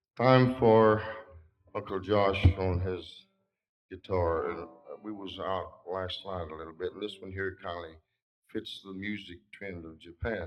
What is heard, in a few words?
Dobro Rhumba; Kyoto1968